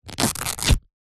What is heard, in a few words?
foley t shirt thick rip